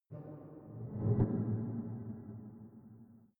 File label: ringing impacts